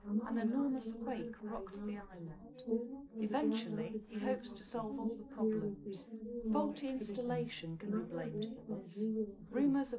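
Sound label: female, live recording 250ms 5cm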